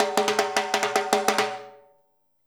FILL, Percussion

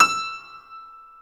E5 R+R PNO; Keith Emerson Signature Series

